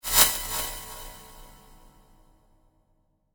multimedia rollover, Multimedia Rollover Tone

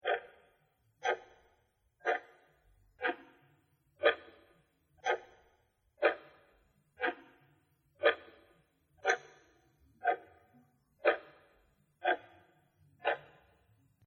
Creepy Clock Tick Tock Loop; Clocks; Machines

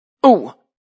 Vocal Funk Ooo

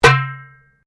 Drum Darabuka Edge, Egyptian Darabuka Drum; Musical; Commanly available Egyptian Darabuka Drum; Drums; Drum Darabuka Edge F

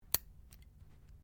NAIL CLIPPERS CLIPPING TOENAILS; Miscellaneous Human Sound Effects; Miscellaneous Human (Sound Effects:Human)

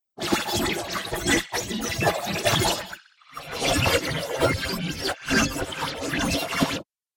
Alien Radio Tuning; Alien Sound Effects

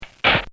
Cartoon Sound Effects Bang; Cartoon Sound Design; Bobby Cole

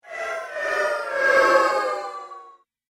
Throbbing Ghost Pulse; Ghost Sound Effects; Sinister, Scary, Spooky; Haunted House Or Graveyard

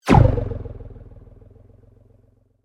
Sweep Mechanical Fast; Created sweeps, swooshes and other movement effects; Sweeper; Sweepers (Sound Effects:Sound Design); SoundEffects-Foundation4

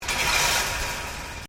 Wire Fence Impact; Hit Sound Effects

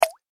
water drip; Water Drip (Single); Nature; McKinney Sound

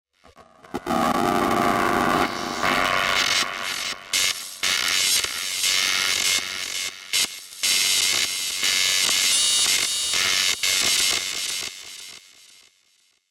Sci Fi Electrical Static Noise Dirty Synthesized; Tech; Blastwave

